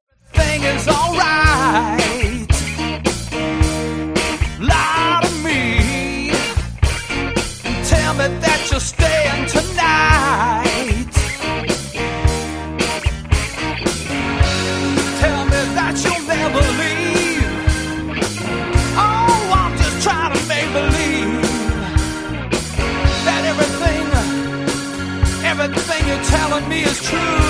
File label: play along drums rock pop and blues